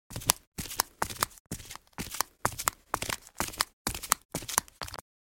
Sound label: Flip flops fast walk on concrete